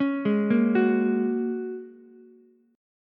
Bb Minor 7th Sharp 5th Ukulele; 6,8,6,9; arpeggiated; chord shape

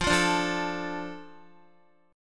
F 6th F down Guitar; F6 strummed

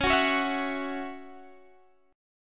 Db Minor Double Flat 5th Db Guitar DADGAD

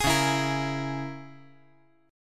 Db Minor 6th Db down; Dbm6 strummed; JGuitar

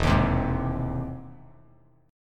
E Augmented Major 7th E down Bass Standard; E+M7 strummed; JGuitar

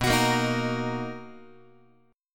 B Major 7th B down Guitar; strummed; JGuitar

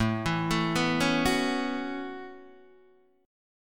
A 6th Add 9th A down Guitar DADGAD

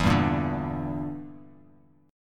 E Minor 7th Flat 5th E down Bass Standard; Em7b5; strummed; JGuitar

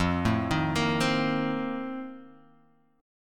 F 11th F, down, Guitar Open F; F11 arpeggiated

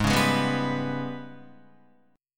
Ab Minor Major 7th Double Flat 5th, down, Guitar; AbmM7bb5; strummed

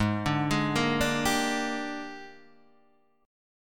A sharp 13th

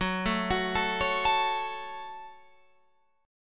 Gb Minor Gb Guitar Open F; arpeggiated; 18,16,18,16,16,16

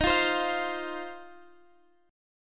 C Minor Double Flat 5th, D sharp, chord

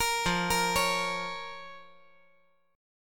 F Suspended 4th Sharp 5th F

